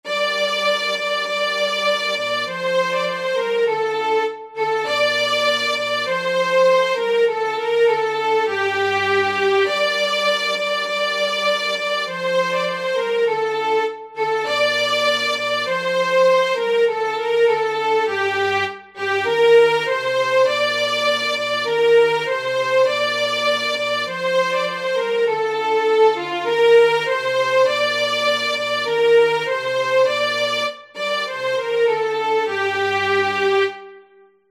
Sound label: Descant; Pastime with good companie